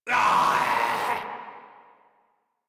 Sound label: Infested Runner Attack Call; Warframe; Runners